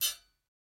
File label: sword hit; soundscrate